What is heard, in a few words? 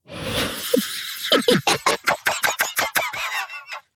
giggle